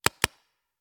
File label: Paintball Gun; Sound Ideas; Guns